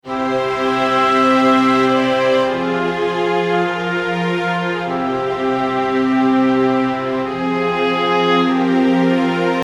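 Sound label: Short RPG, BGM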